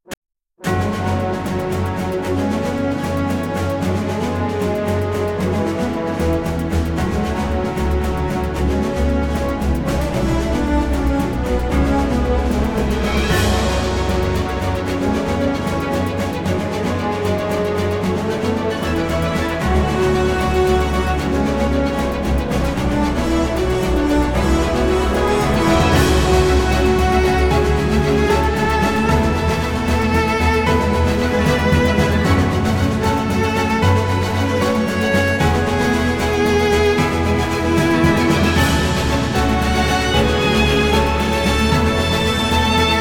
BGM ADV Mountain; aster tatariqus android ios mobile gamerip 2023

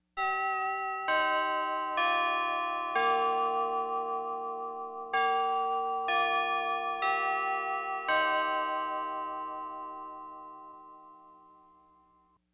Clocks Chimes; five nights at freddys the first generation

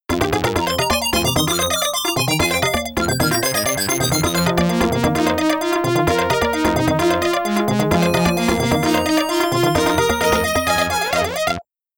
Sniper Stage Clear

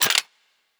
Weapon Foley

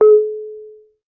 red button appear

